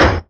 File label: Foley lo